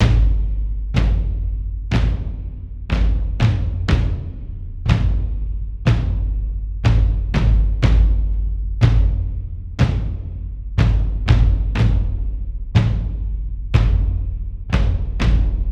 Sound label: Drums; For Honor